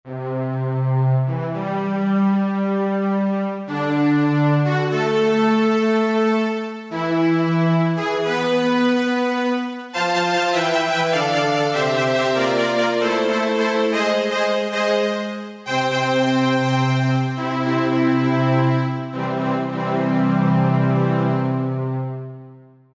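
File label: D70 String Horn; Sonic Reality Modern Songwriter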